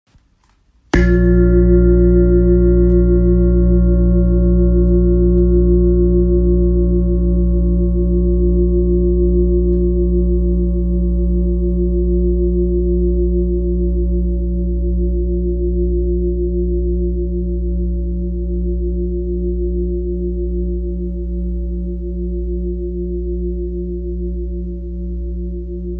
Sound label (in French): Gros bol bengale la diese 9.55kg